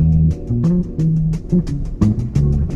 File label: bass; Phonky